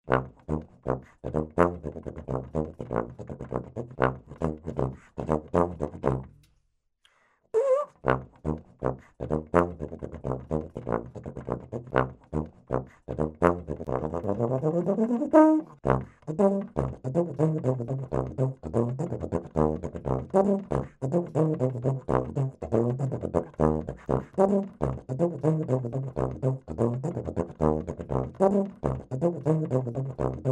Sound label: Funk tuba; Grooves for jazz tuba